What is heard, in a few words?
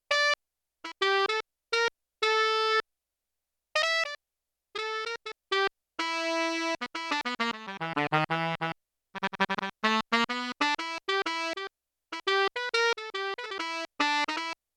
Alto Sax Duo, Yamaha TX16W; Alto & Soprano